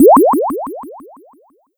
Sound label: Blip; pitch glitch